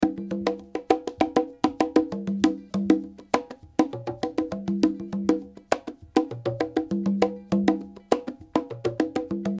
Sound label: Congas